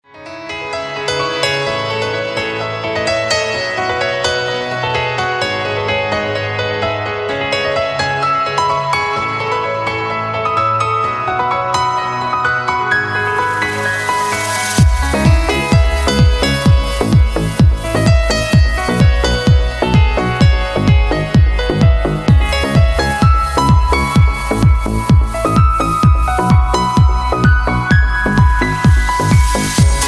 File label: piano tune